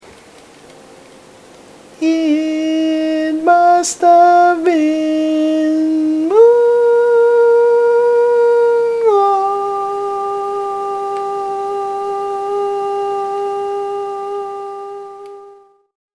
Barbershop Tags

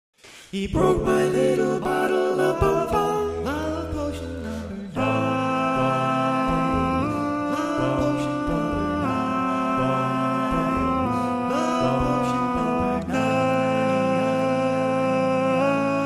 Tenor, Barbershop

